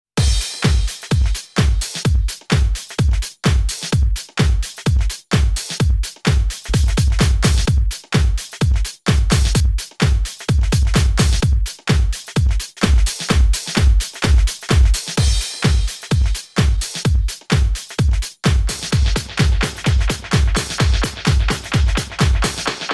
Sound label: Copy Tape Reverb House